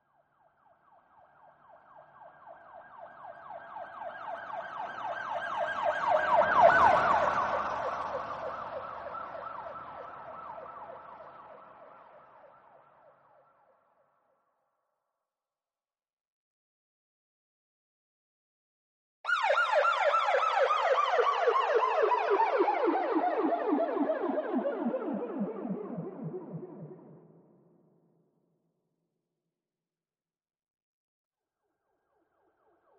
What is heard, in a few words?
police siren